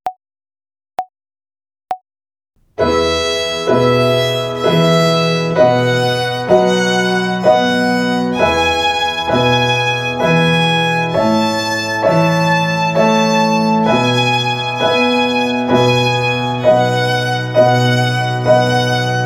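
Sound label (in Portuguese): Ginástica até o dedo 3 Corda MI Ternária, Violino; Violino para os Pequeninos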